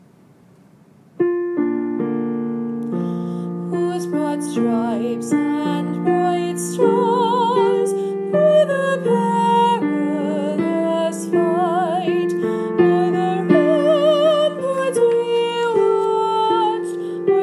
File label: with female vocals